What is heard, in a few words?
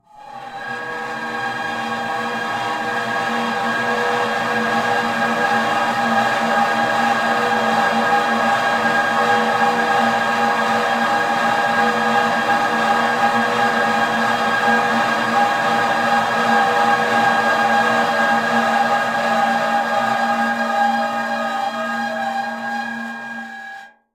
Abstract Spaceship; InspectorJ